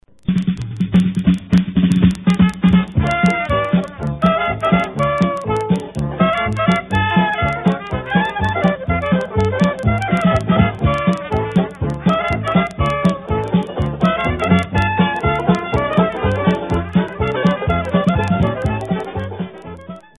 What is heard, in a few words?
dixie jazz